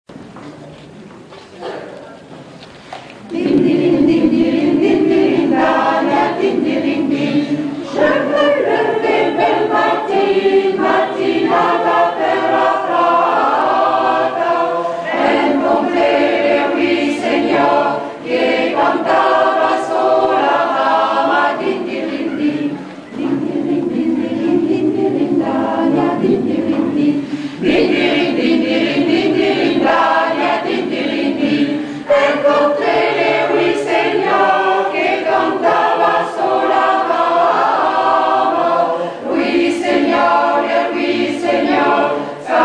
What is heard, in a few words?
concert; telethon